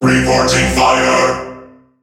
Alarms Fire Alarm; Soundwave; World Of Warships; Entertainment

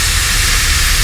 Amb Constructions; Red Orchestra 41-45

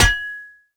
pickaxe strike; Rust

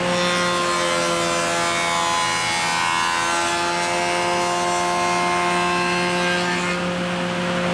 sawmill; ambient; World of Tanks Blitz; map amigosville

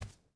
footstep burlap wood walk; Rust

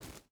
footstep rubber boots gravel jump start; Rust

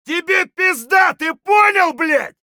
fight; Escape from Tarkov

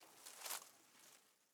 leaf rustle twiggy soft

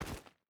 footstep rubber boots stones run; Rust

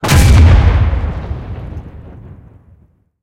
cannon 380mm stum shot; cannons; War Thunder

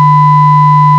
Aircrafts Cockpits VHF Warning Tone; DCS

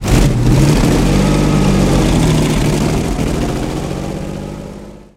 Cromwell engine load

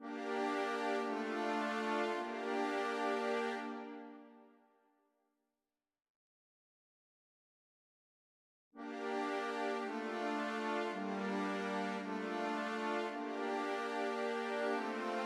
music interactive; World of Tanks

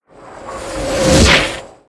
artillery incoming; Call of Duty Modern Warfare

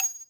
casing bounce soft

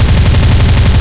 shvak loop, guns

